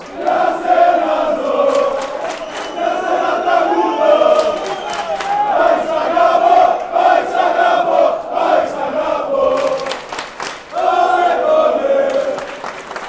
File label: chant; aek athens